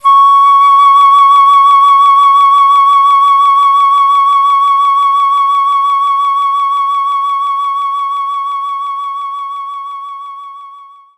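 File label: Flute C#6; knights try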